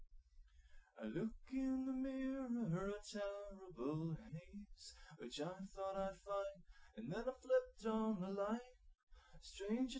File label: nodrums liverec 250ms 5cm sim; SiSEC08; SiSEC underdetermined